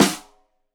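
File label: Snare; Deeply Sampled Drums; Stereo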